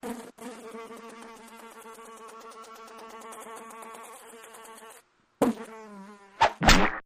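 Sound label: Fly Buzzes Against Window; flies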